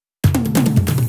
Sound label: Fills, Loops 140 BPM